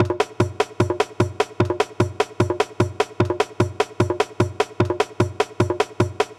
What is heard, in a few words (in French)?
Batterie kabyle